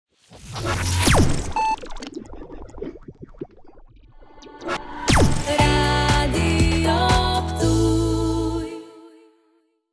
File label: Jingle Stinger